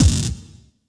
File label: BD, Drum & Bass, 165 BPM; Ueberschall; Drum & Bass Resonance